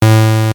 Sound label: buzzer; Dice World